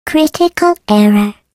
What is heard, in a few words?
turret disabled; video games; npc; source engine; portal; turret floor